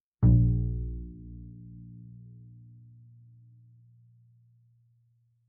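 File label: Bass pizzicato fortissimo sul A Bb2, Double Bass; MIS Pitches - 2014; Strings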